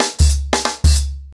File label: 90BPM, ACOUSTIC DRUMS